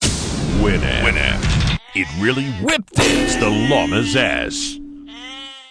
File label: WHIP DING